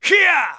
heavy, warrior voice

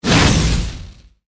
spell chase; archer

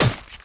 hit sword

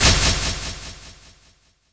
dead effect

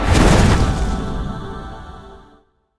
spell holy force; magician

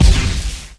spell dash cast; fighter

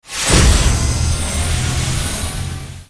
spell final attack cast; fighter

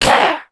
middle damage, voice

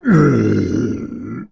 big die, voice

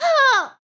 damage flying; warrior

